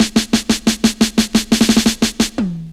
Rolly Poley, Drum Rolls; Zero-G Jungle Warfare 1, 2 & 3

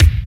KICK, Tekno Industrial; East West

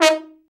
TROMB; Trombone

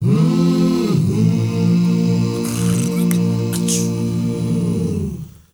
HUMMR, MMM CHRDS; Spectrasonics; Heart of Africa 2

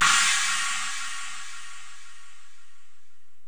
Korg x5, Drums

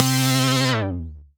Synth, DnB One Shots

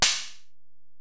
HiHat, Alesis DM-PRO

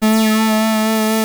OB 19 A4, Rare Synthesizer; Hollywood Edge - Giorgio Moroder Rare Synthesizer Collection; OB 4 VOX.

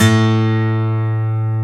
guitar; AKAI S-Series CD-ROM Sound Library VOL-7; A GUITAR 1

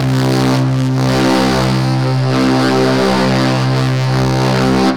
SYN BASS

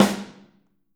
Snare OH; Snare OH All; Drums Percussion

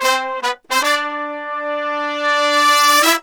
BR 110, BRASS MURGA; Best Service ProSamples vol.14; World Grooves; AKAI

